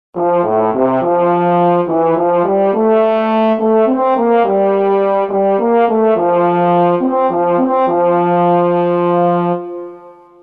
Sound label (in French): petit duo trombone